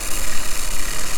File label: machine; Dystopia; silo